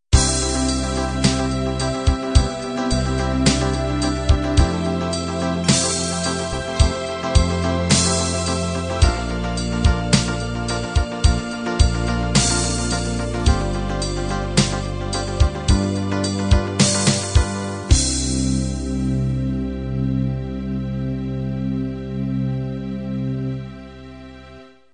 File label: halftime ballad end